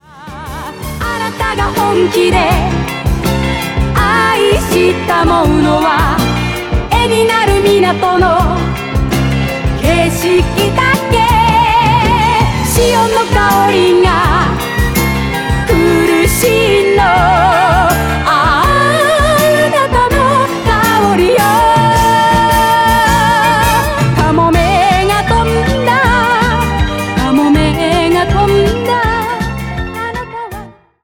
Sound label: idol